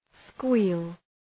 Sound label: squeal